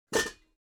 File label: object kitchen pots stack soft